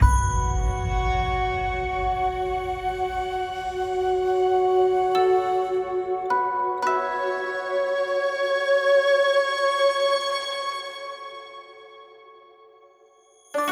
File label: music intro; Aragami; 1-1 area 1